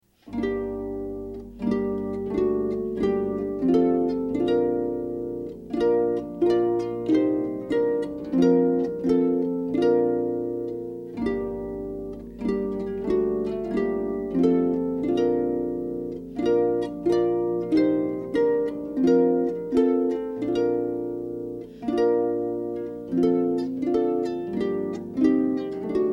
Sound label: 16th Century, Harp; Pavane